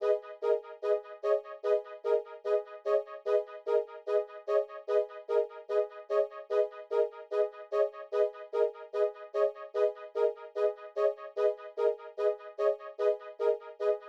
148 BPM G# Min String High; Cymatics; Destiny Gold Expansion Stems; Seven Deadly Sins

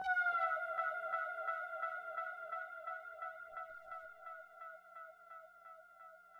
Synth FX, Emin, Hip Hop

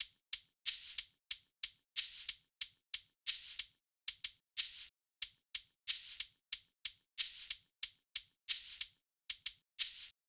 Shakers, 092, Cmin, Drum Tracks

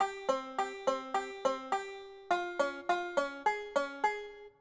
Banjo, 104 Cmin; Hip Hop

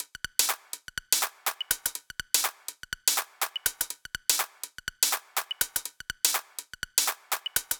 Big EDM Drum Loop Top loop, 123 BPM; Future House; Future House Tools; Big EDM Drum Loops

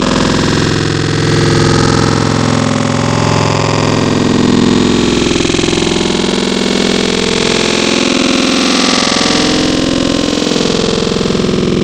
Zipper, Growl Tables; Cymatics; Growl Tables Vol 2; Serum

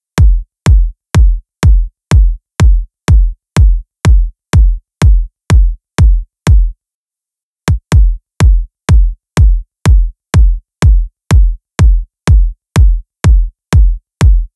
Kicks, Future House 124 BPM; Big EDM; Future House Tools; Construction Kits; Rocking

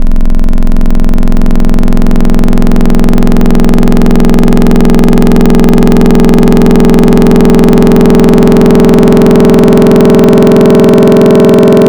FM Table, FM Wavetables; Cymatics; SERUM

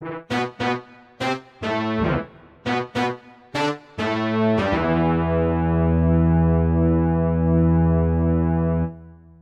Horns, Emin

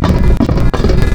Paper Cut; Cymatics; Foley Wavetables Vol 1; Serum

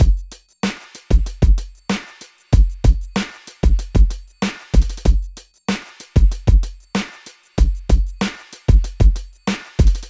Drums; AppleLoops

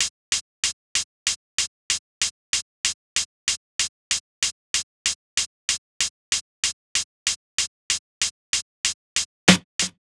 Drums; Hip Hop Exotica; Apple Loops; Big Fish Audio

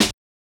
Snare Hit; Hip Hop Exotica; Big Fish Audio

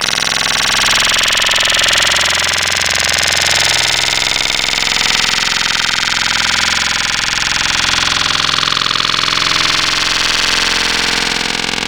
Grain Delay, Serum Wavetables; Cymatics

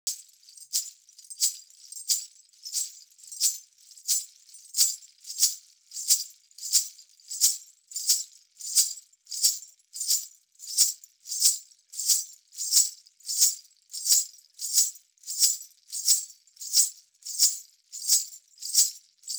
ghunguroo 090, Hip Hop India 090bpm; Hip Hop India Modern Percussion

